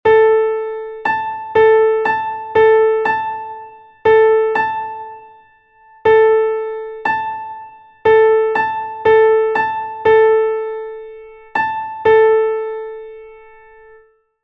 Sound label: perfect octave; Essentials of Music Theory: Elementary